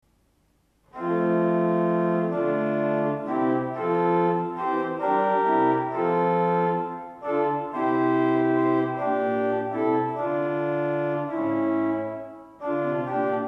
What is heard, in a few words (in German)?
Princ 8, Orgelstimmen